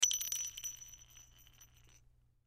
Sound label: Bullet Shell; Bullet Shell 38 Special Drop Cement Roll; Drops (Sound Effects:Objects); Blastwave; Warfare